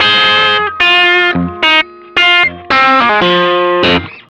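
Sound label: blue riff, Vintage Blues Guitar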